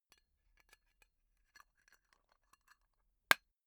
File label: tobacco tin close unsteady long